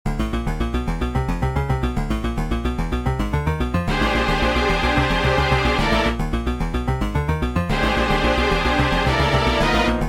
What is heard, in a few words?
Alert; Bug Fables The Everlasting Sapling